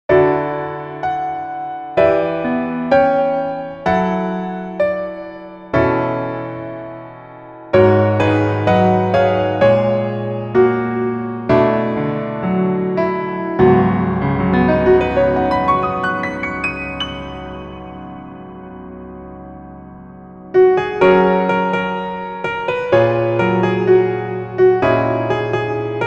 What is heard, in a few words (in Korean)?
Piano Ver 멜로디MR -1키